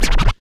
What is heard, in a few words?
FX scratch; Club-50 - Foundations Roland; Scratch 1 S